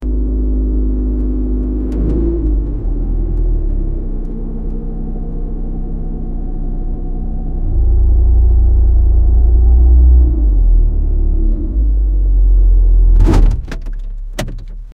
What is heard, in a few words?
inside car driving over, cars